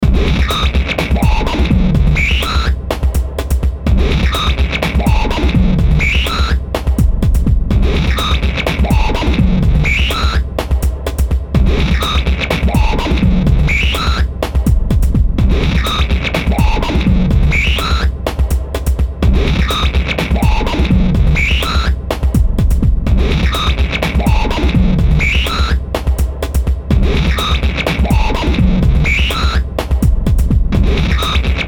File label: BEAT INDUSTRIA, Beat Loop Industrial Hardcore Dirty Heavy Distortion; Miscellaneous Loops